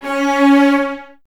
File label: Cellos C#4 Detache; Miroslav Vitous; String Ensembles